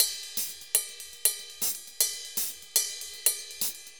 RIDE, DRUMS SAMBA; Best Service ProSamples vol.14; AKAI

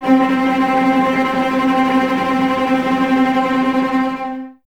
C 4, String Ensembles Cellos Tremolo; Miroslav Vitous

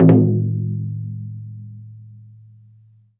DA PAI GU, CHINESE PERC; Spectrasonics; Heart of Asia

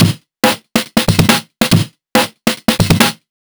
LoFi Beats, 140 BPM

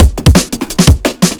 breaks; DOA breaks

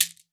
CABASA; Xsample VOL-9

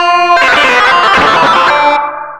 DISTLOOP, Big Beat Samples; DISTLOOP KIT; AKAI

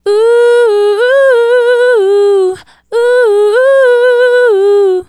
Female Solo, Vocal

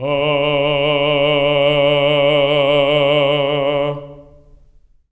TENOR F D3; Spectrasonics; Symphony of Voices

